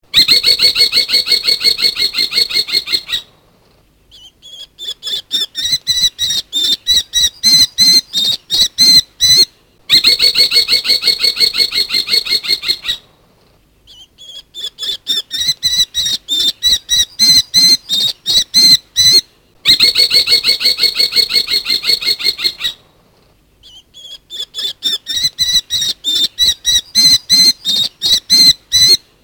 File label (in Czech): Postolka obecna